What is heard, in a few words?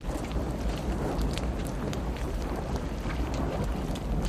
Fire Loop Rubber Cem